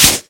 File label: Stone Flashes; gorillakingdom